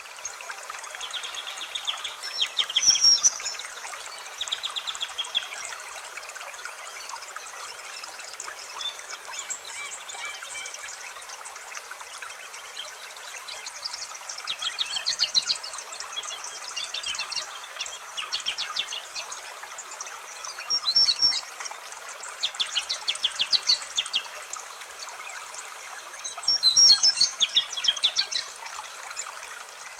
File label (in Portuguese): Natureza e passaros